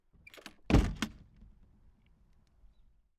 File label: Wood Door Opening